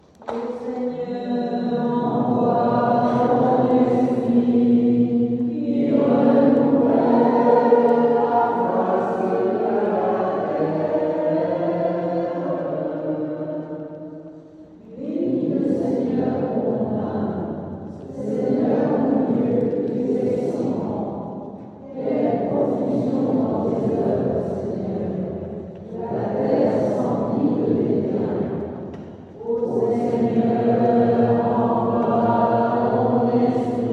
PSAUME O SEIGNEUR ENVOIE TON ESPRIT, EGLISE; LOIZE 79; PENTECOTE

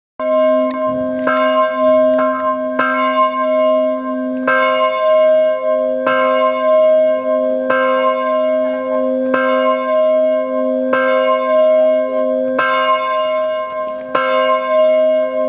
Cloche début; LE VERT 79

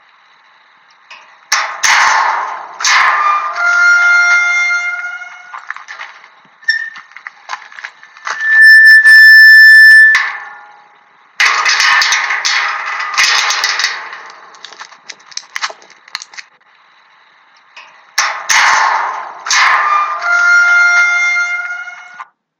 PORTAIL, BRUITS DE PORTAILS

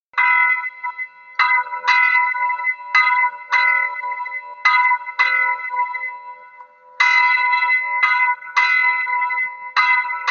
cloche; ST GENARD

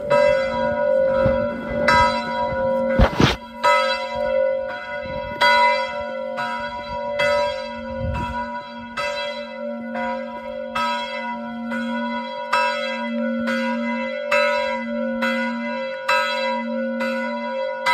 cloche debut; EGLISE; SONNERIE ANNONCANT L'OFFICE

